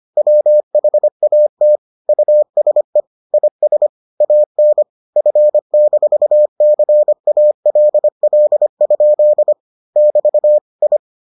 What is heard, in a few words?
morse